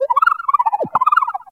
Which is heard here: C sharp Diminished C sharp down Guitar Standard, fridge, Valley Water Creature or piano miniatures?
Valley Water Creature